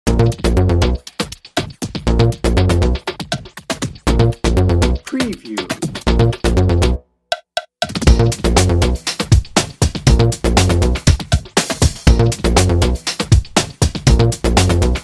adventure beat dnb

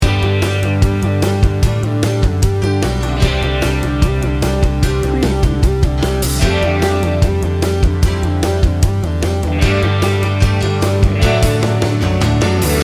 motivational pop rock loop

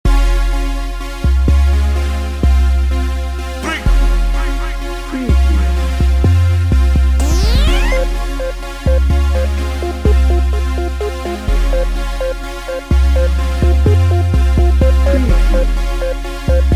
smooth chill no drums

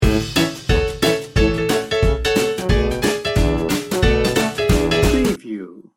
ragtime loop

